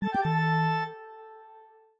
Toccata, notifications; Samsung Galaxy S25 Ultra; Fun

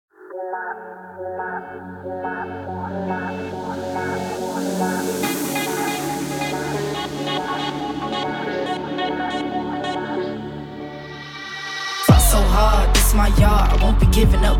ringtone; Realme; 14 Freefire Booyah Edition

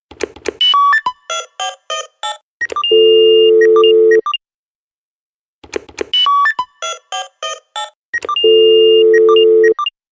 Spacephone, ringtones; Tecno; Pova 7

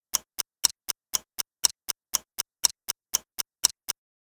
Ringtone; Samsung Gear

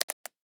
Lock; Tecno; Spark Go 2022

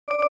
Keytone; SU780 Lollipop 3; LG

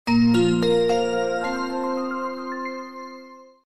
feeling On, switch on off; Alcatel OT-903

